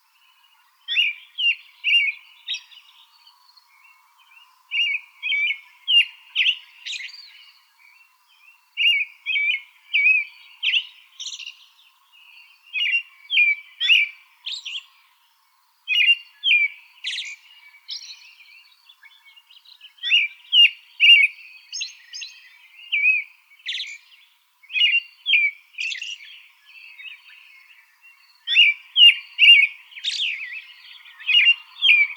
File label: Thrushes; AZFO; deviche